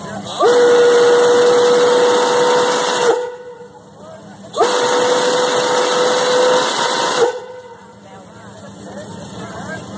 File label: work whistle, toque de locomotiva